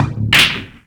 BREAK, SPORTS; 1000fx

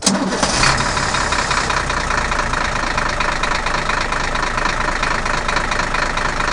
TRUCK

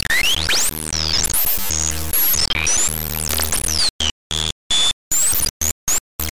tuning

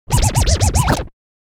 Scrub It Down, DJ sounds

